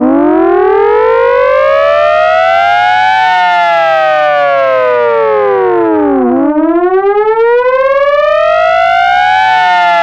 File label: civil defense mechanical siren